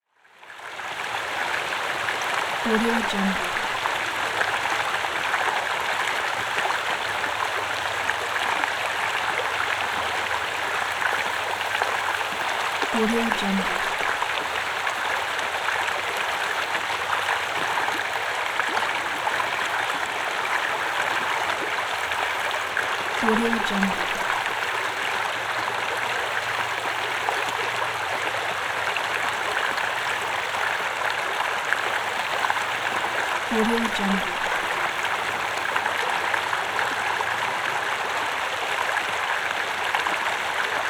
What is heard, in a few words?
mountain river